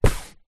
car hand or body part hit rub ceiling roof

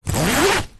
foley tent bag zipper; zapsplat

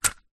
leisure fishing reel bail close; zapsplat

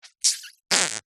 cartoon fart forced airy into wet

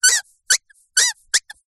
leisure toy squeaky squeak hard broken

